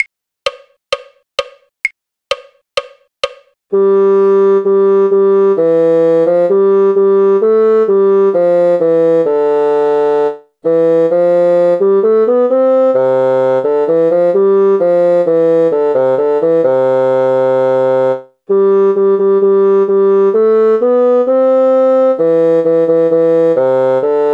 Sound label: bassoon; all in a garden green; folk